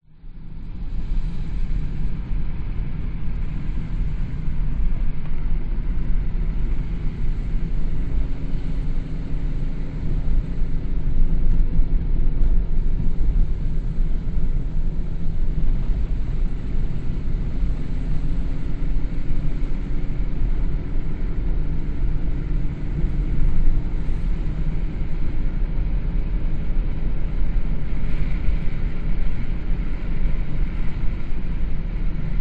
vehicles car drive winding road slightly wet internal light bumps windows; windows slightly open